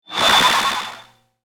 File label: Oscillating Sci-fi Transition